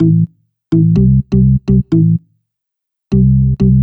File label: DEEPHOUSE BASS 125 A